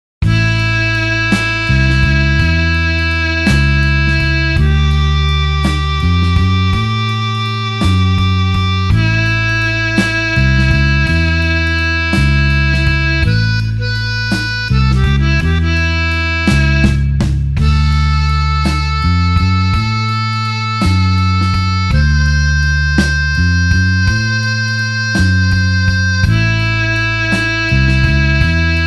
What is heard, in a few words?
blues harp slow A